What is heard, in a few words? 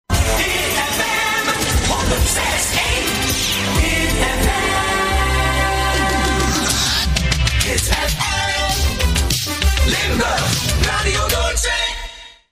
jingles; Noordzee Hasselt